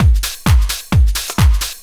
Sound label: drum 130 trance